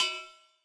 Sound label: subtle hi-hat one shot e key, pedal hi-hat sample tuned to the E note